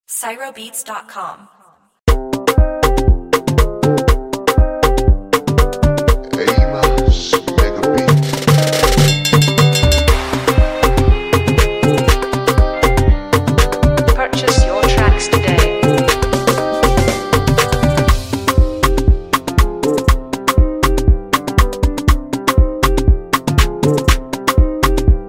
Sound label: Afrobeat Instrumental